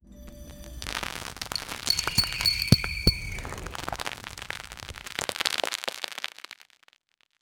cinematic ambient track; textures

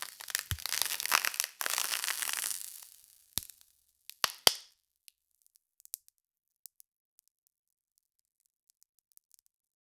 the crackling sound of th

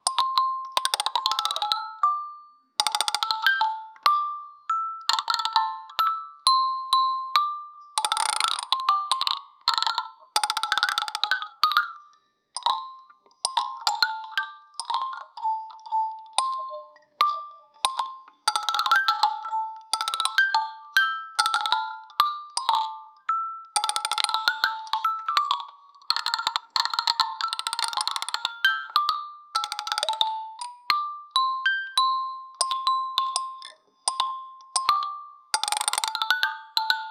musical childrens toy, buttons

